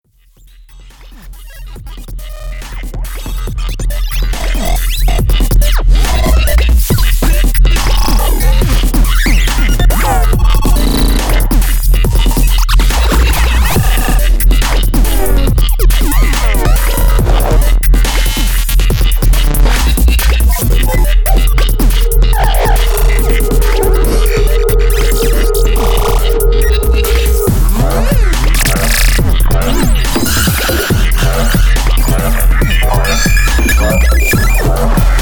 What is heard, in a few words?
Arcadia 8 Bits Invasion; FUTURE LOOPS